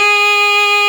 DUDY G#3; SoundBytes - Early Patches

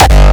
hardcorestyle kick

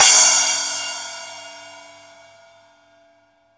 SPLASH; East Collexion; Drum 1 Dry

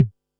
Bassdrum, Pearl SC-40

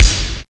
BD2 M1, DRUMS; East West; Global Sounds

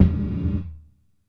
Gated Tom; Gated Reverb; Univerb; musicradar

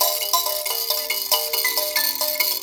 VIB KALIMP, VIB KALIM; Spectrasonics; Heart of Africa 2

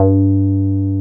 303 SQUARE G3; 303 SQUARE BS; Drums In Your FACE!

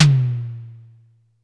Drum X Tom; Pearl Drum-X

